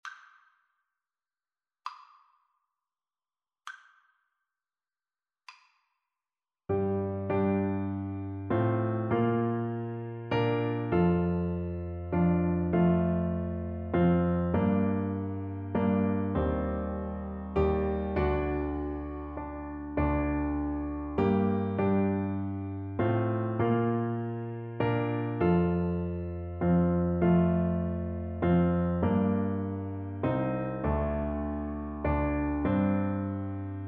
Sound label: what child, Tuba